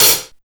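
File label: BIG 125 hi hat, Big Beat Drumloops; USB Soundscan vol.25; AKAI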